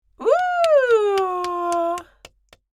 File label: Old lady applause and cheers